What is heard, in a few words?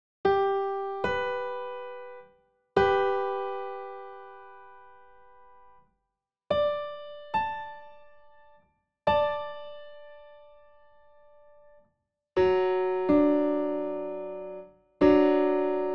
consonance